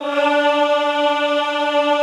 EHH D3, Classical Choir; Best Service

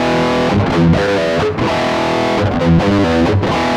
E Chunk, Guitars

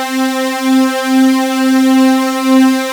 RAW SAW; MKS

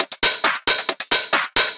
Hi-Hat, EDM; Sonic Foundry (Sony Creative Software); Afterhours EDM; Session 10 - Come In Hard

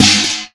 TINBOX, PERCUSSION, METAL

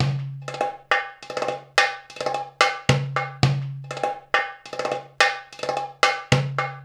PERC LP, Arabian Traditions

